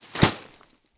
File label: Tent Unfolded Dropping On Dirt; Sports